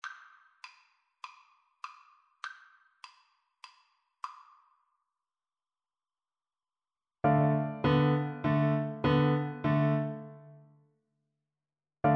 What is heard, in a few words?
boogie, Flute; elise